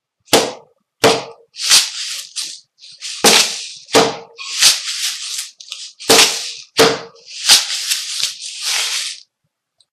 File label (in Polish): stuk stuk